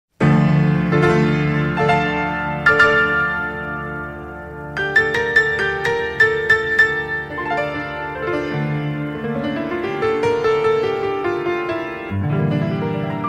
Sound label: Violon